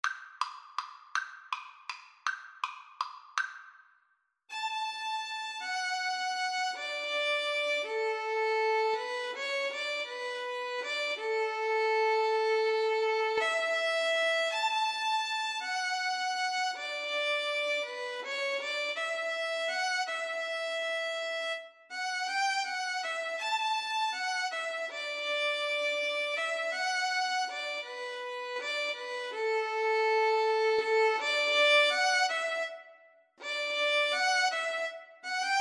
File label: violin duet